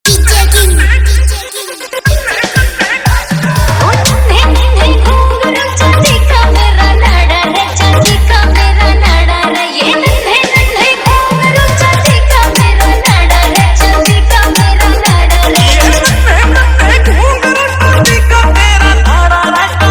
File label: Dj Remix Hard Bass Full Vibration Mix